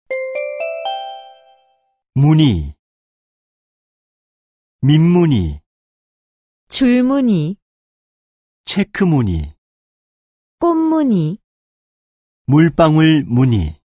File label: K-POP